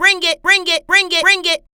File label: vocal loop dry "bring it" 135